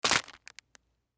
Candy Bag Plastic, Candy Taffy Bag Package Set Down Table; Food; Foley